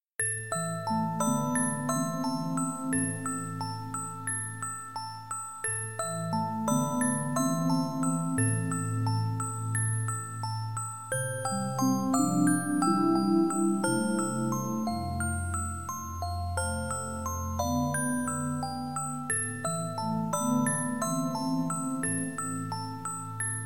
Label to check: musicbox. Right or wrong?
Right